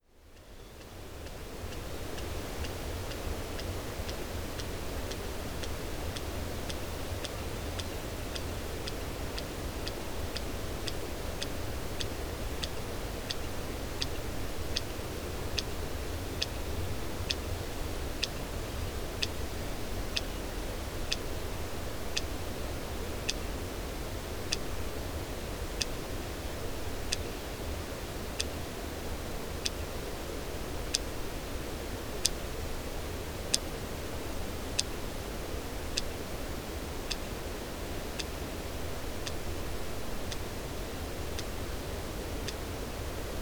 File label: Cachalot Sperm Whale